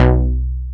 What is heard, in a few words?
ELEK BASS; ELECTRC